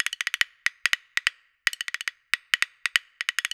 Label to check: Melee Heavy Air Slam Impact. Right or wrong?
Wrong